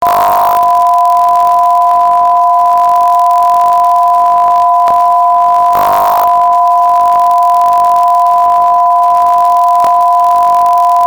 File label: PCM-X2 playback test, test tones